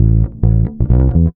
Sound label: REAL BASS, BASSLICKS; Ueberschall; Jam Box